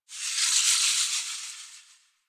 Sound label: Gas City Distant Textures, Ambience; Warframe; Corpus Gas City